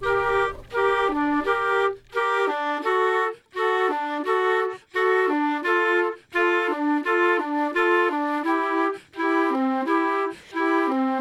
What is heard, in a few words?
flute, Loops, Sad